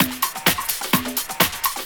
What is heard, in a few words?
Latin Loop 128 BPM; Best Service; House Definition VOL-1